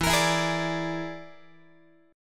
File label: E 7th Flat 5th, down, Guitar; E7b5; strummed; x,12,13,14,12,13